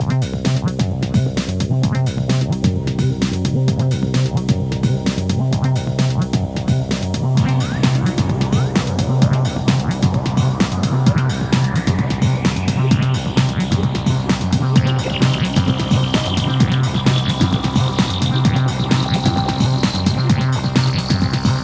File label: Techno Style; 1:58 min.; RCT